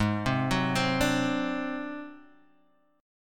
G sharp 7th Flat 5th G sharp down Guitar Half Step Down, chord